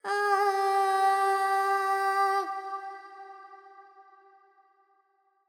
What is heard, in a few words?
Carnatic Female Alaps, Panthuvarali, 100 G, FX